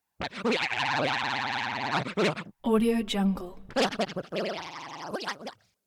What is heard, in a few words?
head shakes fast trills rising in pitch